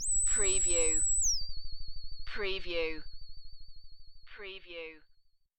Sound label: Ufo contact